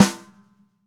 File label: Ludwig Black Beauty Snare FF Room; Sonic Foundry; Sony Creative Software; Vital Drums; 1969; Snare One-Shots